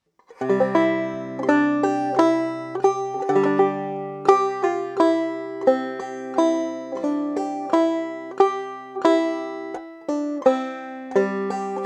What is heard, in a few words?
slow; Christmas